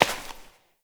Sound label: Step Ice; Warframe; Koumei